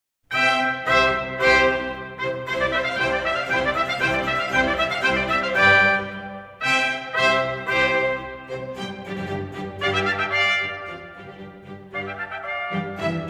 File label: Concerto For 2 Trumpets in C Major, Allegro; Vivaldi; RV 537 I.